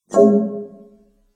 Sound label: Electronic Hit